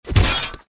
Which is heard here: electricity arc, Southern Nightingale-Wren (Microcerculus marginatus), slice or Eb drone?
slice